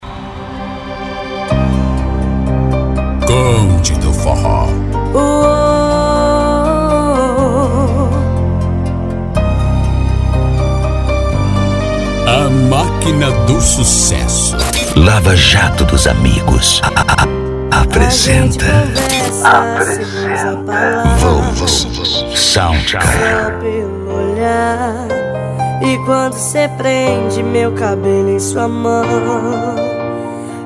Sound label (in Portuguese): sertanejo e pisadinha; cd volks sound car